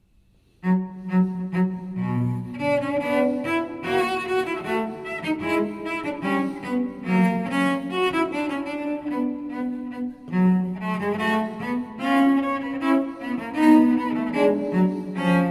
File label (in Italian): Andante, Sonata per cello solo in re magg; TWV 40,1; GP Telemann